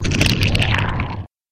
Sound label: monster water bugs